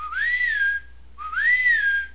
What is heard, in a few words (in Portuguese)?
ASSOBIO; BRASIL